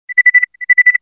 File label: Cicada